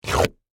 Suction Mud Gurgle, Texture, Suction, Wet, Gurgles, Mud; Water Sound Effects; Water (Sound Effects:Nature)